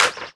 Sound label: Clap Grounded, Drums; One Shots; Ableton Live 12 Lite; Core Library